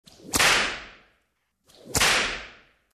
Whip Hit two times

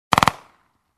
Short fast machine gun fire

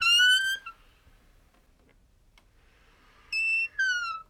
Squeaky Door Medium

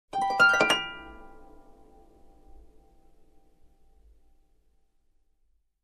Sweet harp chord strummed

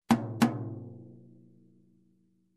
High Tom Hit twice

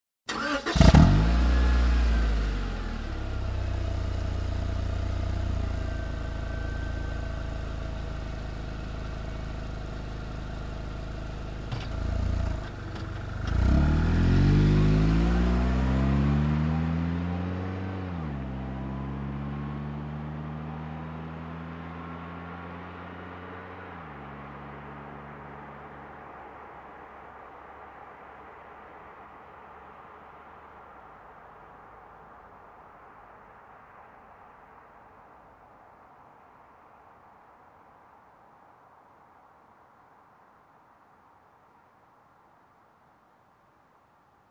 Car engine ignition and drive away

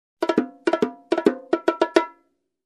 Galopping Conga Groove

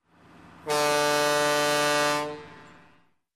Truck Horn

